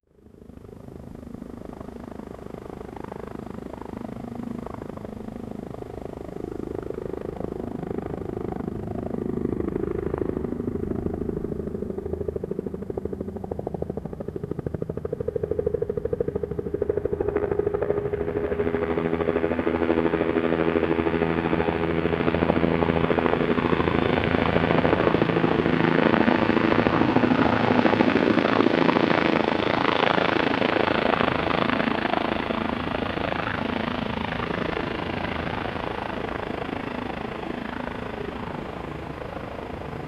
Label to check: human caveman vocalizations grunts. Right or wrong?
Wrong